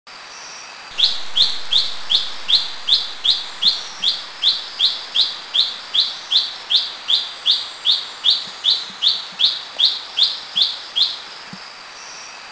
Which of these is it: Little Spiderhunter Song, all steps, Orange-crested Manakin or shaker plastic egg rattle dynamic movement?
Little Spiderhunter Song